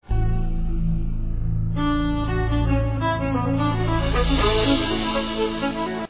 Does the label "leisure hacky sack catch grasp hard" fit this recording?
No